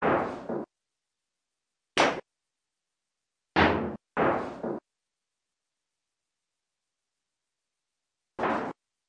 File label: ac duct rattle; modern warfare 2; amb emitters